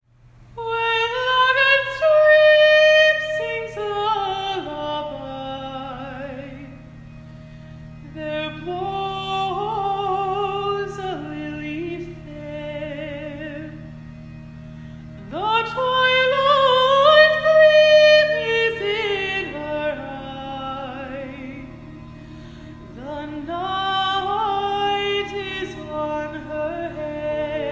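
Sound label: [F] My Lagan Love [singing][Irish song][acapella]; vocal layers; eerie sounding; a day late for St. Patricks Day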